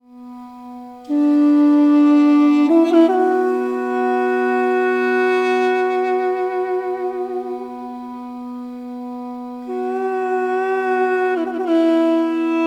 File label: duduk; Ilya Efimov